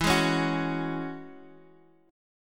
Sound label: E 6th Add 9th, Guitar, DADGAD